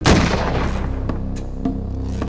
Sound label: death; singe